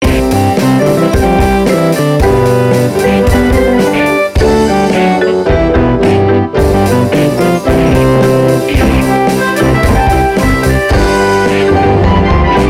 piano musical

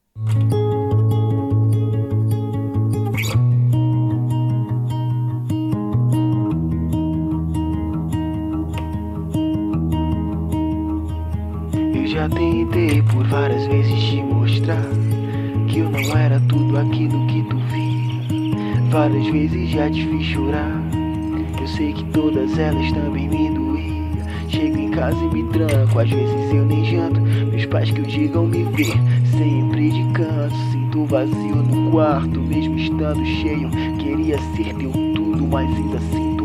acoustic type beat

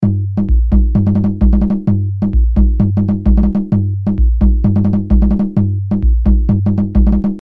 atabaque bass

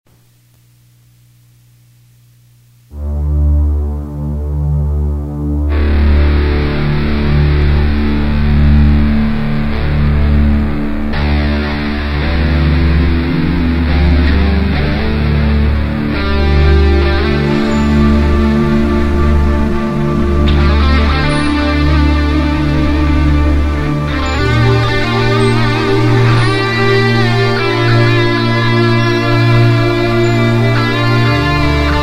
guitar intro

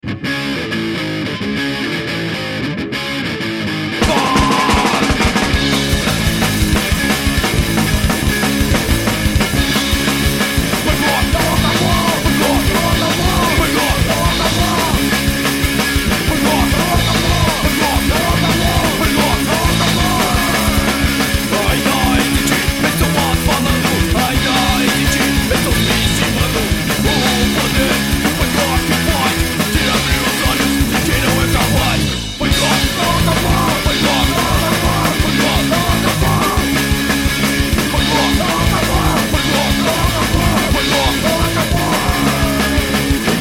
punk rock

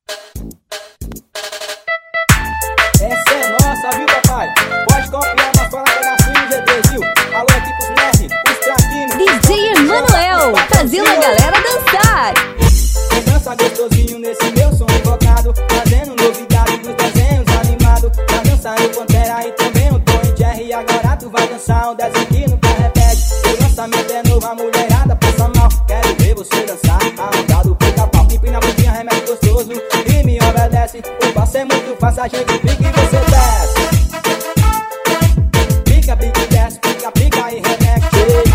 swing remix

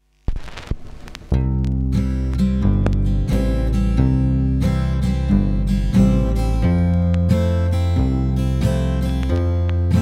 acoustic sessions

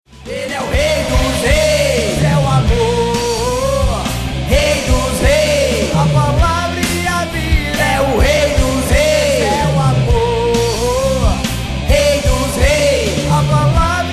white metal

